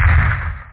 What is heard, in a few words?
bowser step